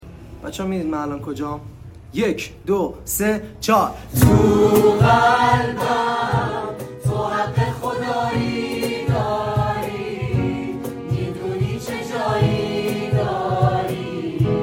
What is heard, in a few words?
Guitar